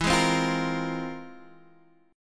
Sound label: F Minor Major 11th